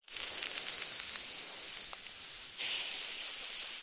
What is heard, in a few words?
leisure can silly string spray run out